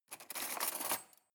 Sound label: cutlery cake forks drawer grab